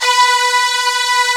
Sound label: B 2-VL S.H, VIOLIN SECT; AKAI S6000 CD-ROM - Volume 1; Strings